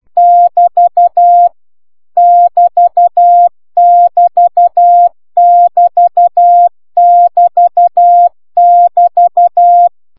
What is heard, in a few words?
cw